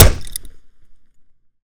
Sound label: wrench hit; weapons; melee